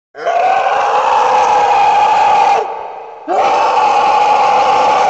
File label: roar; el colmillo de cobre